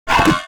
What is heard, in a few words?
damage; Mob